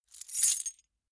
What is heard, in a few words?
metal keys handling